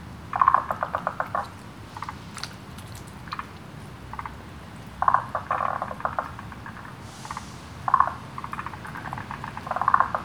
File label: Spawning of the Forest tree green frog; Amphibian